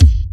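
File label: kick, Boss DR-202; Drums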